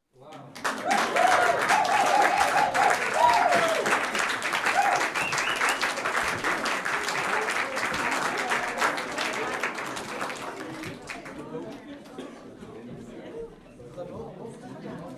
Small audience claps male wouw

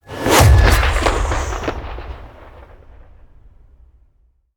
Trailer Hit Destroy Punch

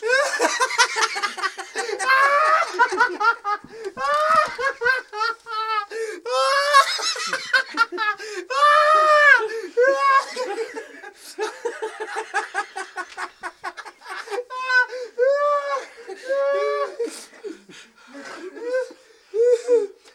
Laughters Male Spasmatic

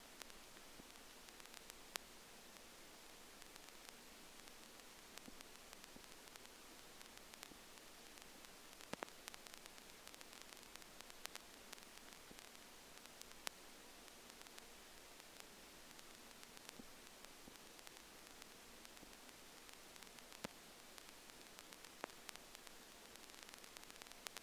Casette Noise

